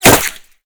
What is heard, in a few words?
impact masonry; Modern Warfare